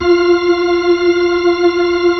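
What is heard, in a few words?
B-3, Hammond B-3 organ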